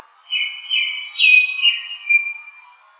akahara; zukan

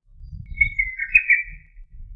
hototogisu; zukan

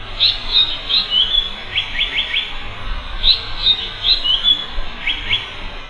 sankouchou; zukan

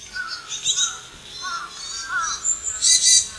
yamagara; zukan

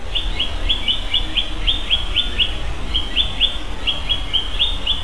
sankouchou; zukan

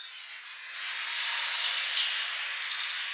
hakusekirei; zukan

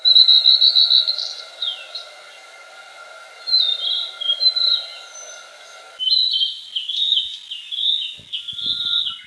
kibitaki; zukan

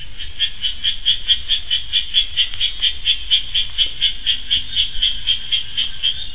amagaeru; zukan

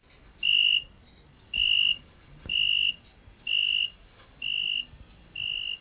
kanetataki; zukan

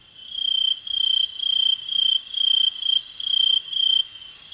aomatumusi; zukan

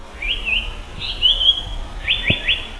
sankouchou; zukan

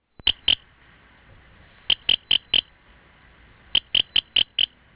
mitukadokoorogi; zukan

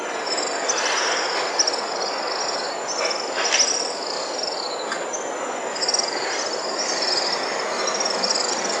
himeamatubame; zukan

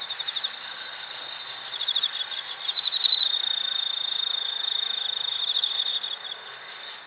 enmakourogi; zukan

